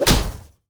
pandas melee; wukong tower

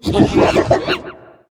boss chimps

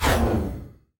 spiders hero range

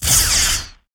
savant attack

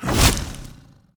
guardian lions hit loop start

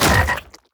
enemy death; sfx; noxious Horror

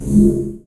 Demon Portal Tele in

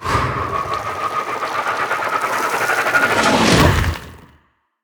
mud tower; wukong princess

